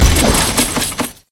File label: chain